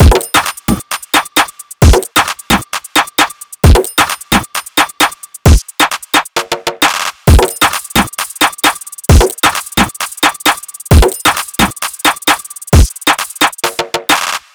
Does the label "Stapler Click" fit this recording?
No